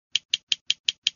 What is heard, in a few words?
dices varios